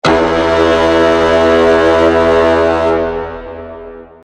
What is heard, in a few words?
mega horn